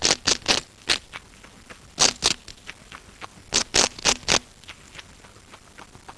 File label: cracker, chinchilla